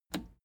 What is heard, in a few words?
plastic button scratch smooth push disable